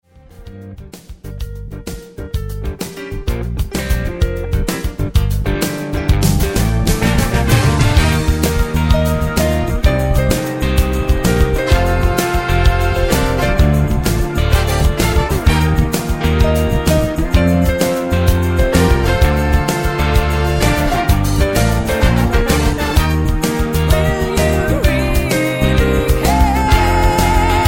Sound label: Karaoke Version fade